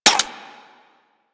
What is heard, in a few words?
Rifle Mech B; Drake